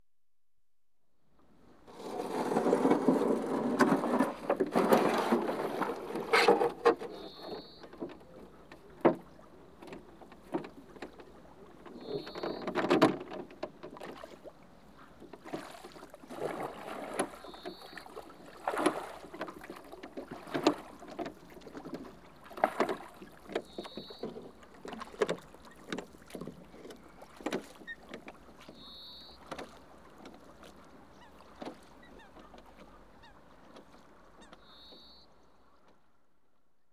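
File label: Rowing Boat - 1 MAN Rowing, Launch; Ships And Boats; BBC Sound Effects Library; Ships And Boats 2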